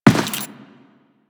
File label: Run Default, Footsteps; Warframe; Teshin